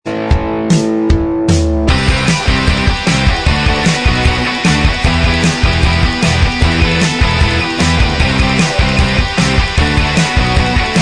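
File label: instrumental track, backing tracks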